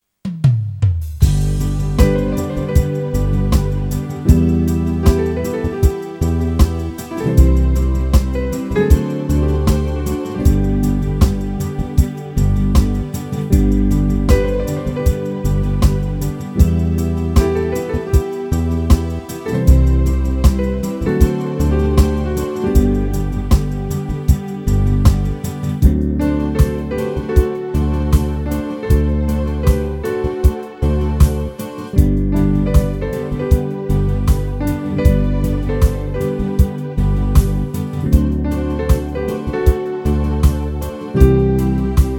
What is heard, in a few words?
Morning Schlager